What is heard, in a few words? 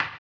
HAMBONE, Vocal Blues Soul; Spectrasonics; Planet